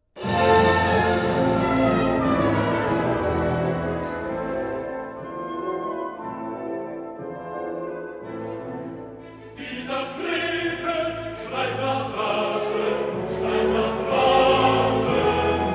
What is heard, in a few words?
Chorus